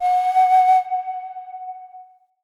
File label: Flute Alt F Sharp; Player Instrument